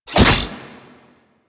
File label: Ball Catch Local; Warframe; Missions; Tenno Ball